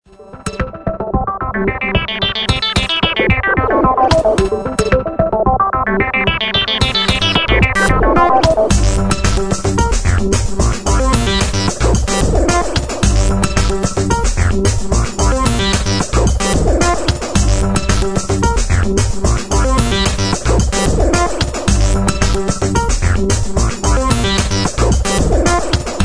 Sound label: techno cue